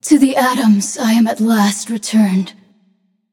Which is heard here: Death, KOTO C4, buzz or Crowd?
Death